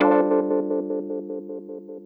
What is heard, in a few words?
CHORD -L, Drum & Bass, 180 BPM; Ueberschall; Drum & Bass Resonance